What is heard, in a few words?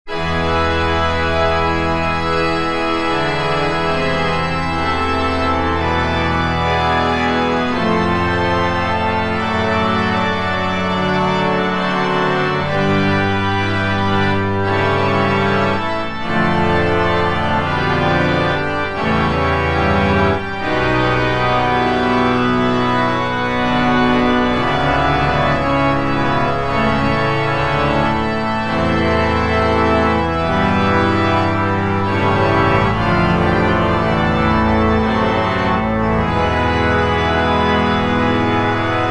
frescobaldi tutti, Virtual Church Organ